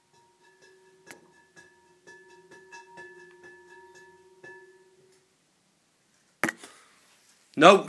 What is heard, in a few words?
Imagined Immersed and Dissolved Soundscapes